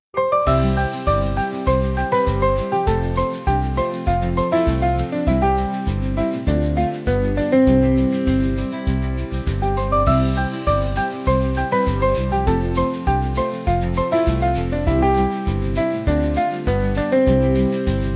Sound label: Catchy Melody, instrumental